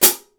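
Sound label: HI-HAT PEDAL; Greytsounds; HI-HATS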